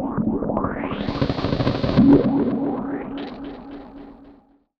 Synth Wah & Tape Delay, contact mic, Tin Of Guitar Strings; musicradar; contact mic madness